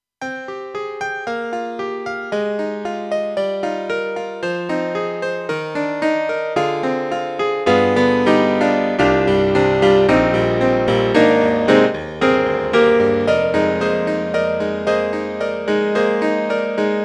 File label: AKAI SG01p, BRIGHT PIANO